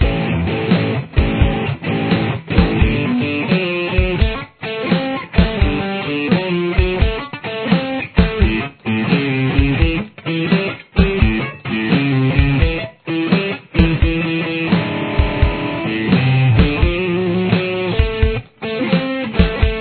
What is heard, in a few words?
guitar